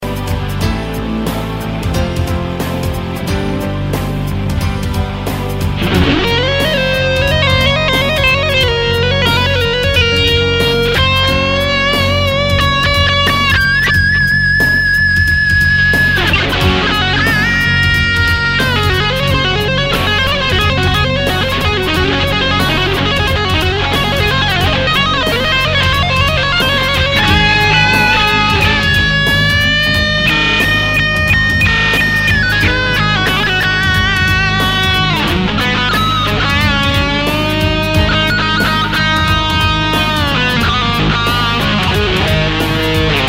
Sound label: solo jam